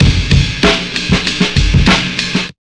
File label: 97 DRMS, Pure Electro; USB Soundscan vol.03; AKAI